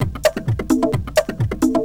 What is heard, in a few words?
130 addon, DRUMLOOPS, HIHAT; Ueberschall; Techno Trance Essentials; LOOP ADDON3